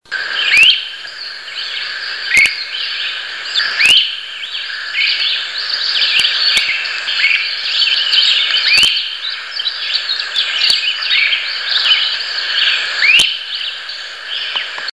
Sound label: great crested flycatcher